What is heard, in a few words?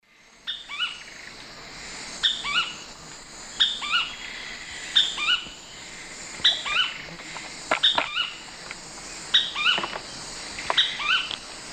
Synallaxis rutilans, songs 2 birds; S. America; Eastern Lowlands